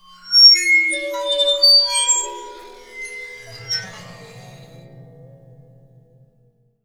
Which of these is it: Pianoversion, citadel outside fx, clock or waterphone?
waterphone